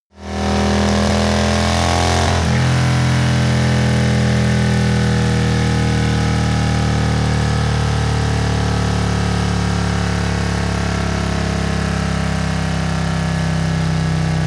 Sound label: motorcycle harley decelerate long; motorraeder; verkehr transport